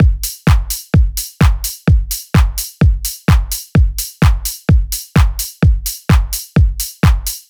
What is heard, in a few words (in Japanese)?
リズムパターン